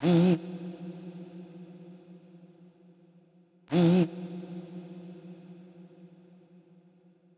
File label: Fx Vox Loop 130; Equipped Music; Loungin House